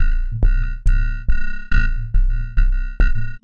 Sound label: 140 bpm, ringmod thuds, drum loops and breaks